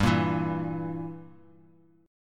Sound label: G Minor 6th; Gm6 strummed; JGuitar